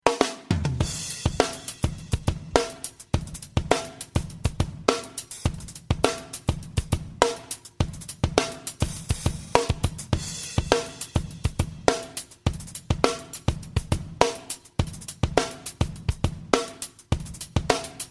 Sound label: Silicon Bias High